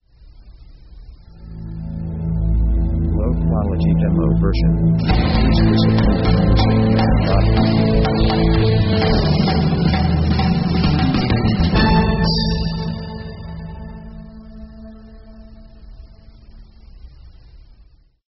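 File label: pop orchestral break; power themes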